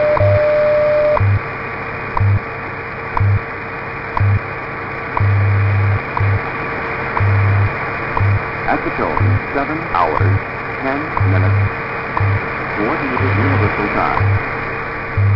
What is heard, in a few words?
WWV 25000kHz